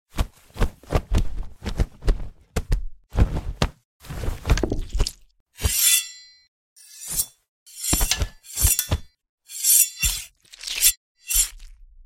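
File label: Fight Gore; GB-SFX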